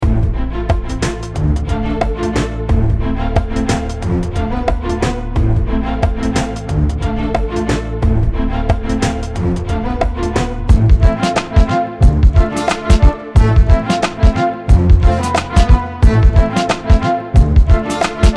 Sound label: scarecrow music